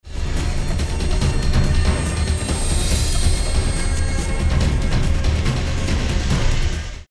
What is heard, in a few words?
Music Combat; SOG